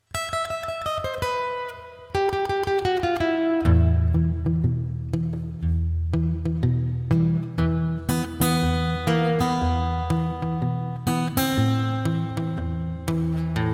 guitar; Dexter

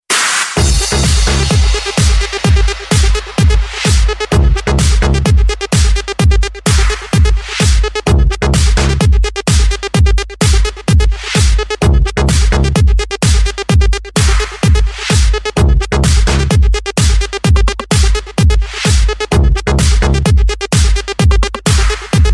house music

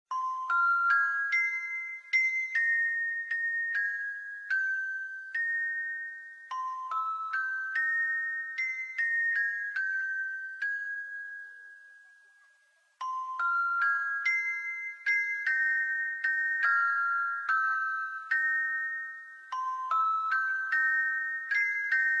star locket melody; ringtone; sailor moon

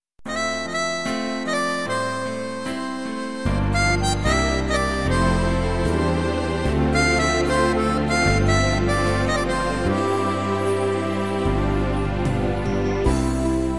bright calm

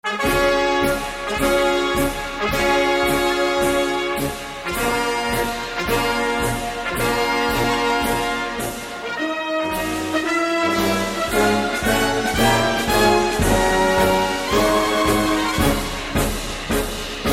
german military marsch; ringtone

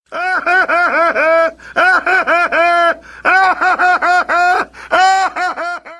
laugh; adventure time; jake

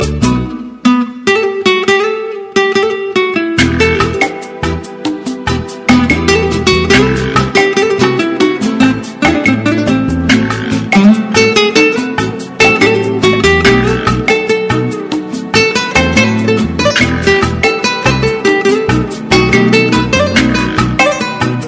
lovely guitar tune

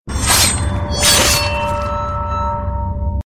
sword message

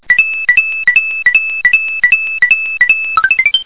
coin up; super mario bros

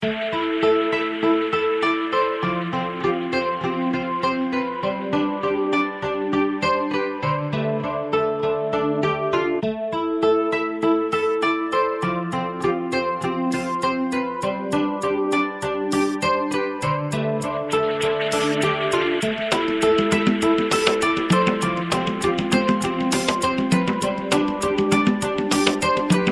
guitar chillout

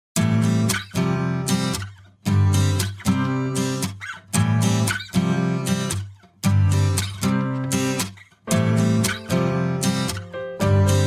guitar love

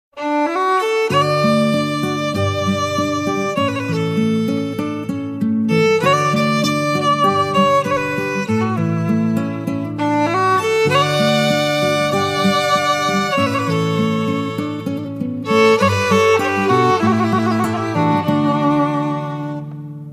only music

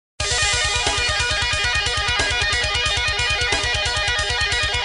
awesome guitar sms

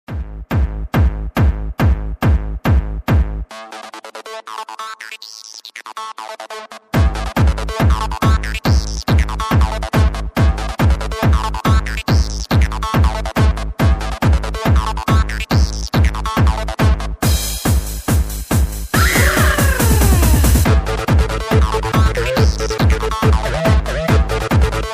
tecktonik

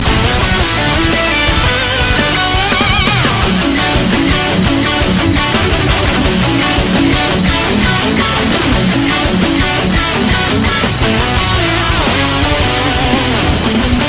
magic of guitar

